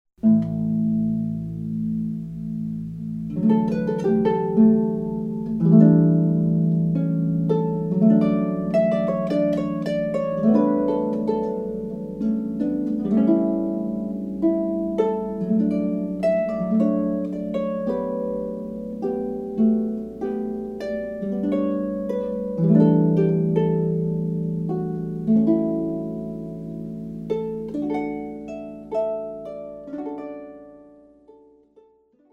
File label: Oboe